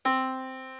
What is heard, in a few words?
yamaha Disk